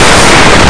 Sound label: book